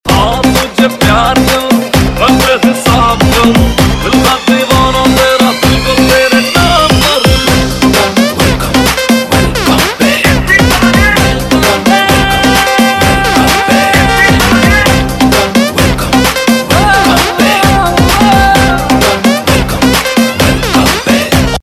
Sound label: Beat Mix; Bollywood; Welcome Back